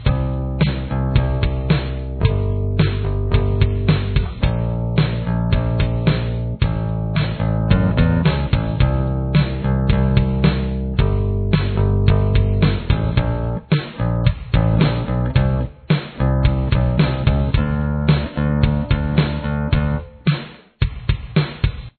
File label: chorus bass